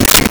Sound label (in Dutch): bloep; Home-made